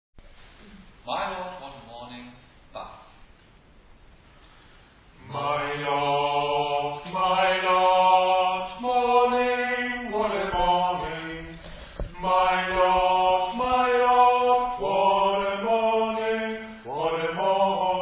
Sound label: Bass; My Lord What A Morning